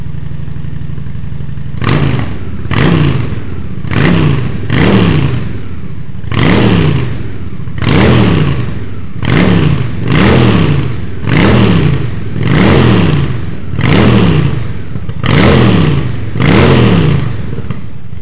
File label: VT 750S Honda, exhausts